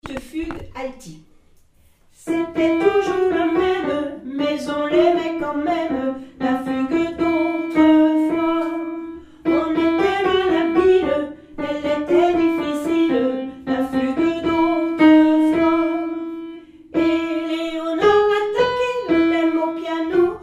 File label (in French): alto; arrgt annick deschamps